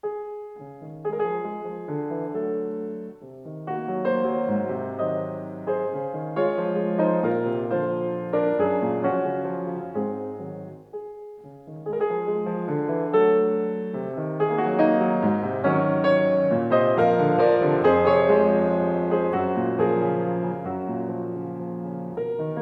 waltz

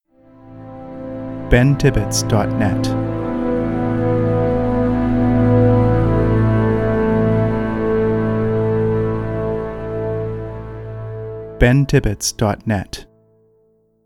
Sad and Dark Ident

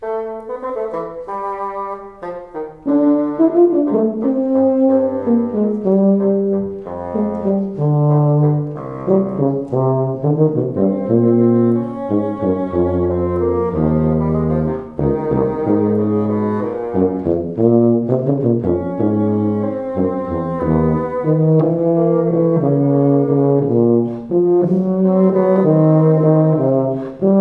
Fagott and Violoncello; Stucke fur Fagott and Violoncello (II.); Paul Hindemith; umass recitals

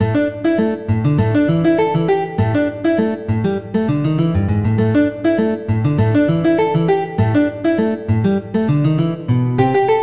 cute guitar duet

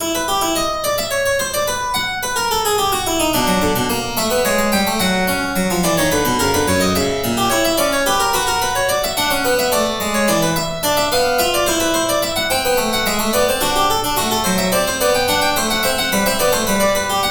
fugue

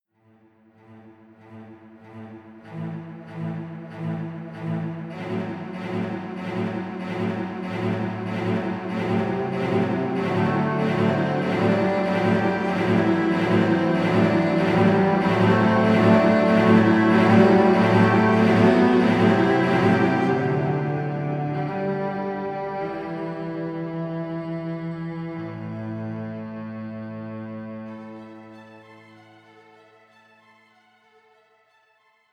crescendo, chiptunes

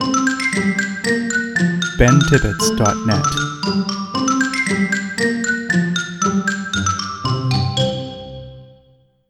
Slapstick Moment Ident

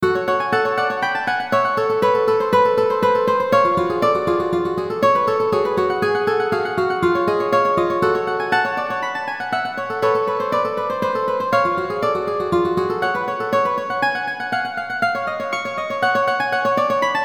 guitar trio, chiptunes

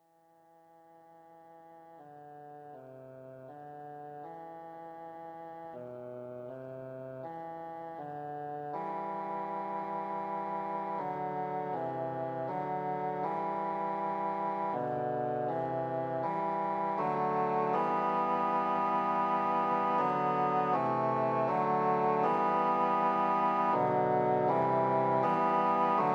crying in E minor, chiptunes

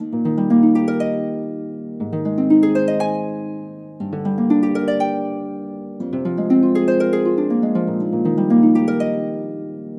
a moment with the harp, chiptunes

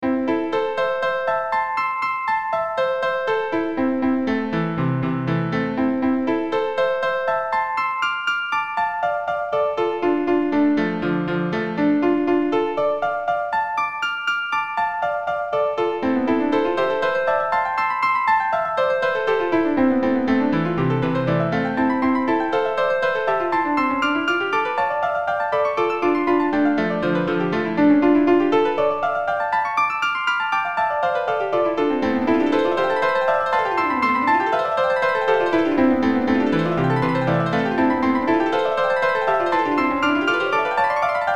piano superplay, chiptunes

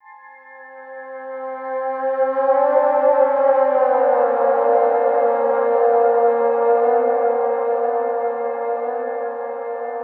creepy, chiptunes

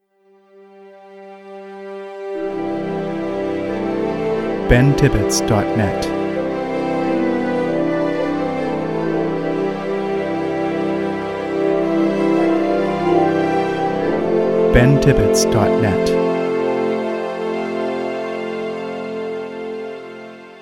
Relief Ident

